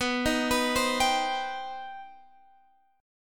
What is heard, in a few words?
C Minor Major 7th B